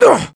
damage; mohaa; dialogue

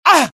death, pain; mohaa; dialogue

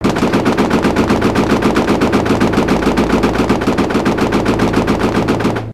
death; singe

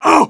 damage; mohaa; dialogue

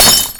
glass break; cdogs

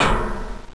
player ladder; xash3d fwgs

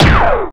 fusion; cdogs

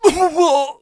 death; mohaa; dialogue

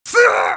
damage, pain; mohaa; dialogue

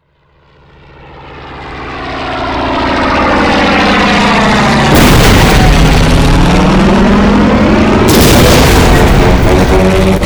Plane Crash, vehicle; mohaa

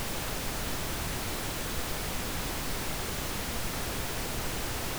sound thrust; singe; spacerocks

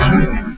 laser shot; hurrican

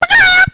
chicken; superbroswar

